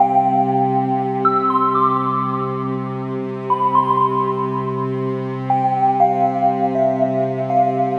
Melodic String pad

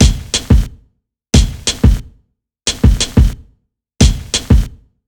Soft Kit C; Ударные и барабаны; Electric Jazz; 130 BPM; Drums; loop; Электро Джаз

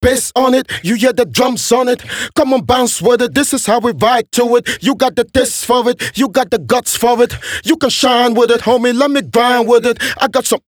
Rap Do It Verse, vocal

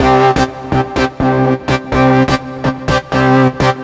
Rocksyn

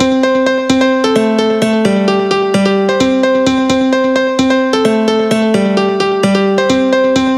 Gyro Piano, electric jazz

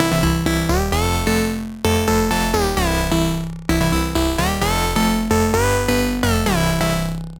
Gyro Lead; Synth Sample; 130 BPM; loop; Электро Джаз

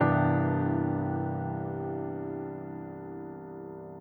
Ending A, jazz

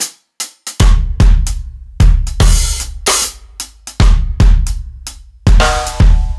Rimshot Kit, регги; Сэмплы ударных (Регги); Reggae (Регги); 75BPM; Drums; loop; Барабаны

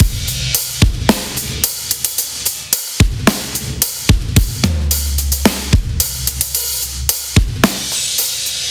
Tenor A, electronica

